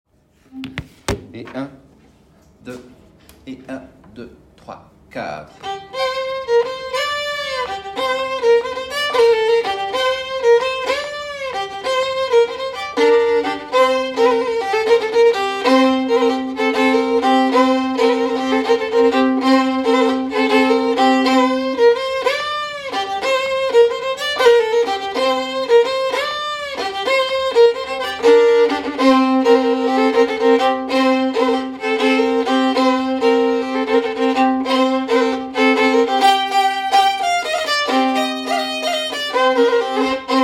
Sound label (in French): Rondo violon